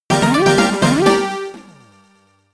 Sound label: Boss Appears, amiga